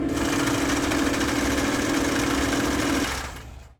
ALARM AIRCRAFT Mechanical Stall Warning Short, Aircraft Control Board, Boeing 737 Airplane Cockpit; Articulated Sounds